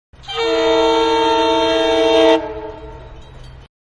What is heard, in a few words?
Single Medium Distance, Train Horn; Trains (Sound Effects:Vehicles); Manhattan Production Music